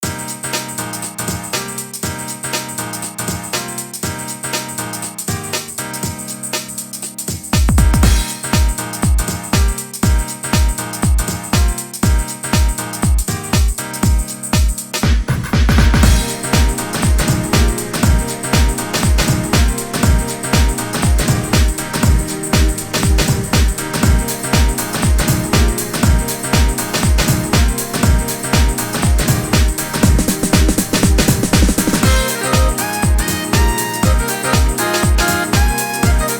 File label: Clumsy Jazz